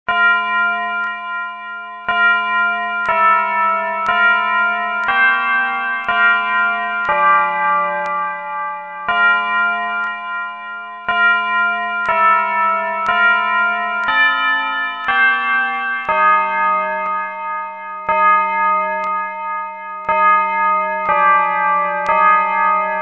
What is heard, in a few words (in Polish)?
hejnal parafii; gostkowo